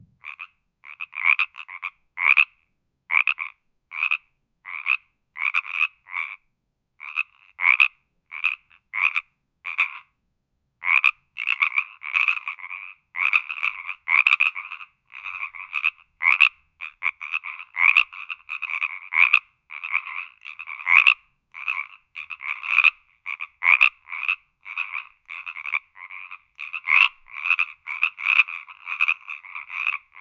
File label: frog sounds, croak